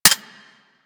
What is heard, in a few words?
M16 Mech, rifle; Wraith